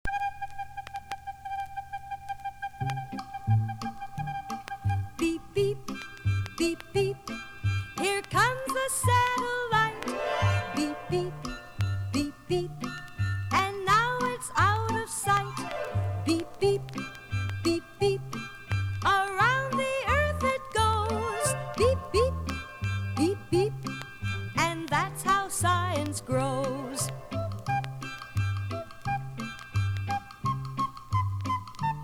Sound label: beep beep, singing science; acme